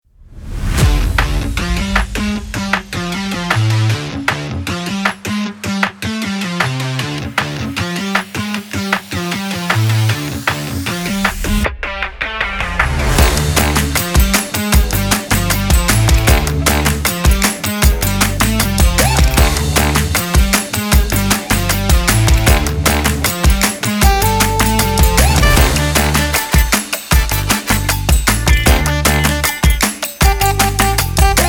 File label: energy